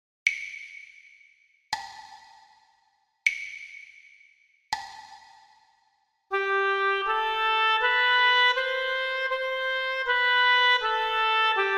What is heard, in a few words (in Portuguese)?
percepcao ditado